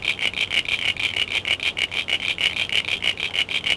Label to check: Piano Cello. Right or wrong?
Wrong